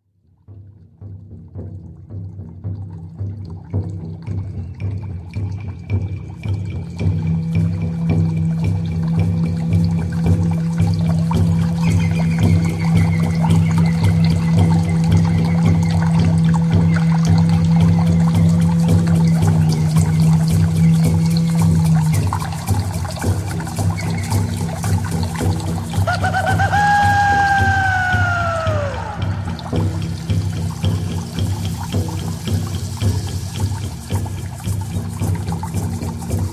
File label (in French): musique chamanique tambour